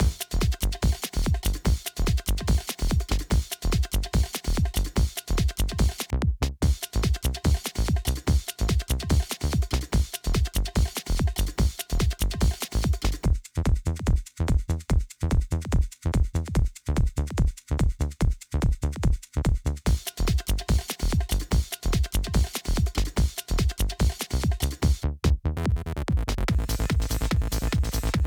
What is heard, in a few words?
Prison Chill